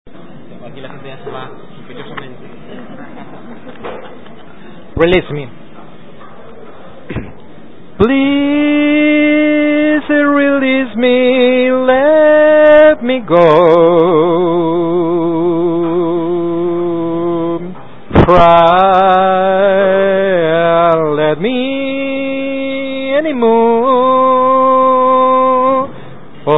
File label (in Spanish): canto